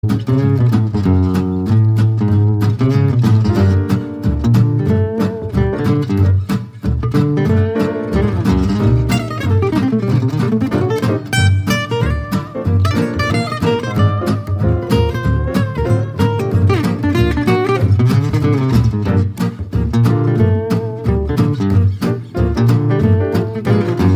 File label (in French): blues en mineur, jazz manouche